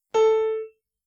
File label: Piano A5